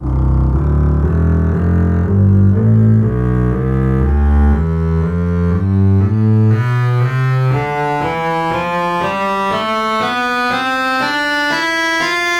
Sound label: contrabass tonleiter